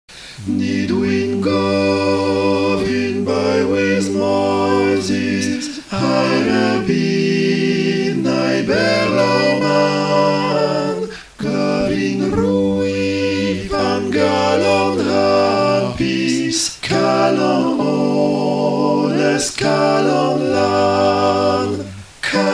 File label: tutti; calon lan